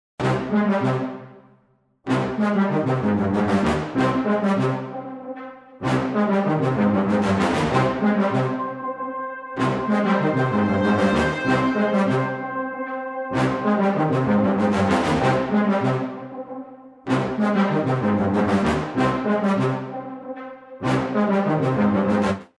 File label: Brass Loop Designer Triplet Performance Combo